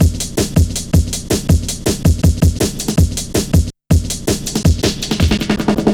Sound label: Drum Stepz, Drum Loops 161.5; Zero-G Jungle Warfare 1, 2 & 3